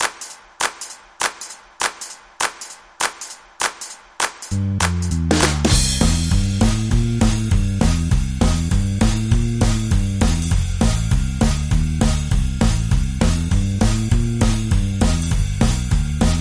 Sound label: Rhythm Underscore